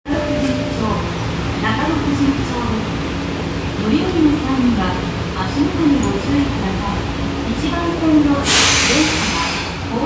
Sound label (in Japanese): 丸ノ内線中野富士見町西, train sounds